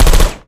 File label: turret fire 4x